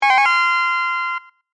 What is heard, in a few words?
Multimedia Positive, Technology, Multimedia, Positive, Element; Alert; Alerts; BlastWave